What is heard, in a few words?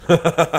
ahhaha